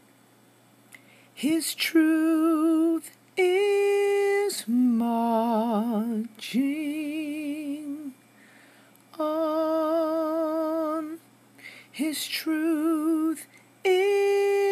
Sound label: Different Melody For Battle Hymn, dino song ideas